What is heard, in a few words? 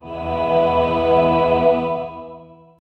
dark gothic hero ascensio, orchestral